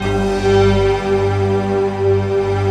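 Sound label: Chinese orchestra; Optical Media International; Sonic Images Library; Chino Combi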